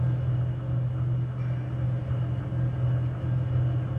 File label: Amb Verb; Puddle; puddle plus ios gamerip 2018